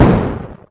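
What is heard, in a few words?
small explosion; VIDEO GAMES; solarq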